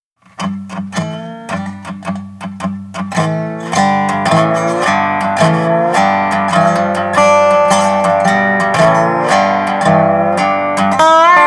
Dobro FX

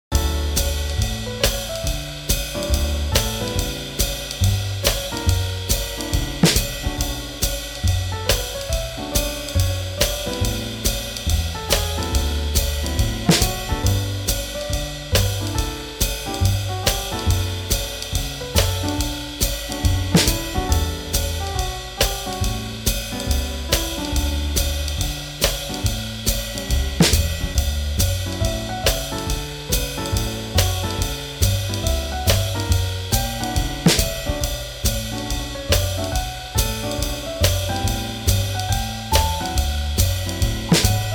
Swing Jazz

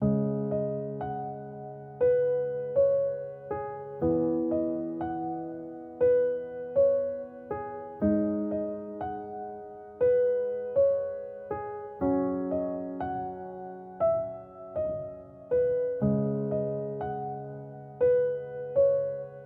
piano; 감성 BG

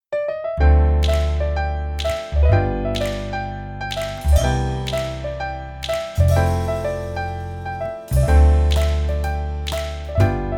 Swing; 밝고 맑은

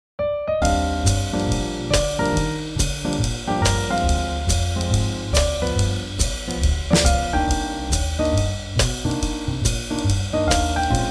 Swing Jazz

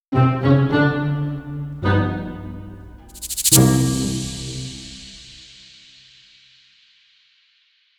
Clean

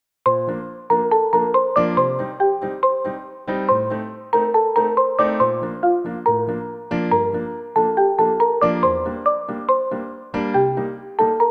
Newage; 밝고 맑은

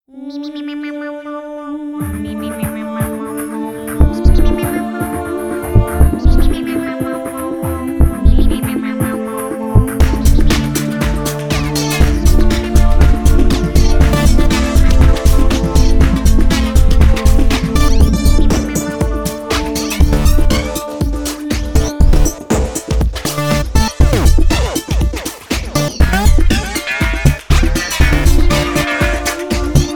Electronic, EDM

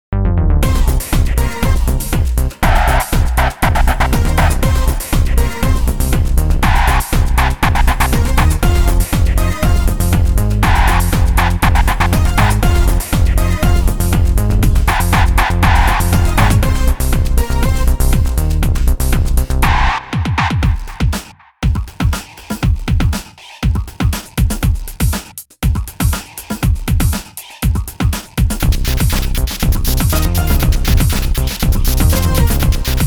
Electronic; Action 리듬

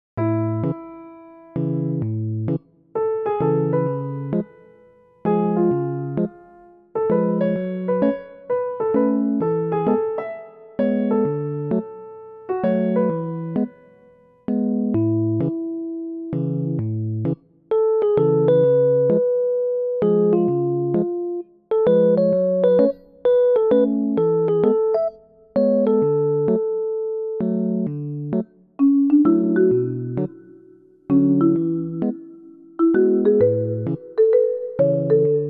Newage; KIDS 귀여운

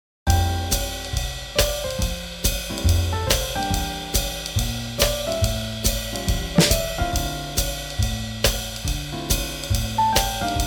Swing Jazz

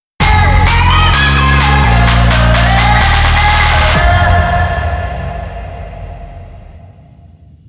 EDM FX

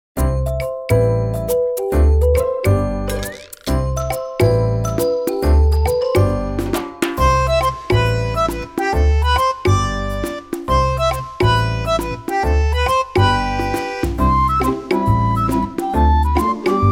Bright

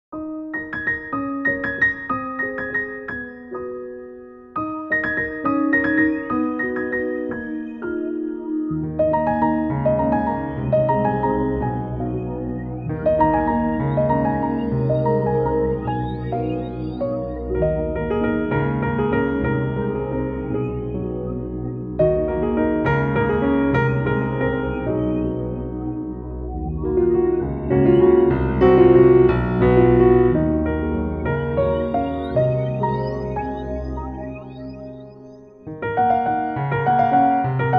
Newage; 감성 서정

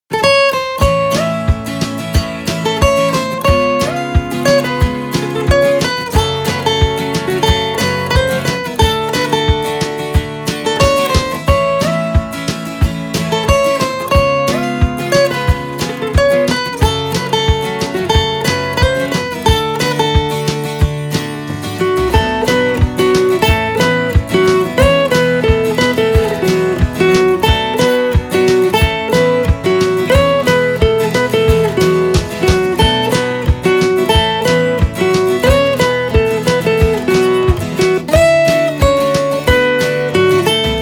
Country Song

